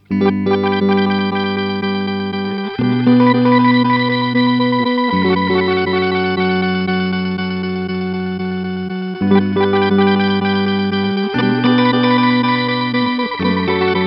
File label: Count to 5 Sound; Montreal Assembly